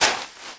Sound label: Walk Dirt; Player; Footsteps